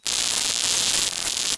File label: drums